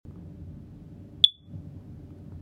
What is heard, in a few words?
Error signal, rauchmelder; Fujian Taisun Electronics Technologies Company Limited; SD-1982